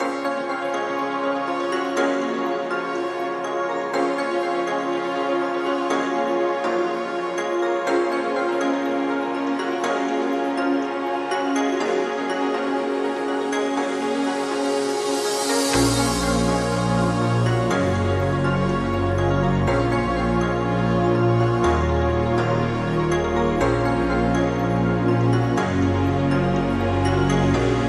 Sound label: electro dance